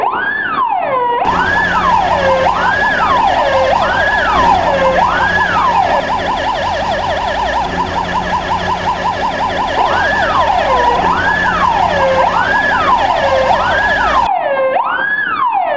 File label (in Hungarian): tatra, szirena